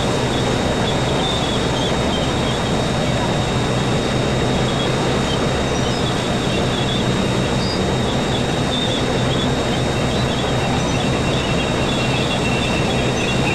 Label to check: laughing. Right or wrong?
Wrong